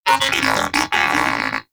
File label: Moa Pet Tenacious Greeting; Pets; Warframe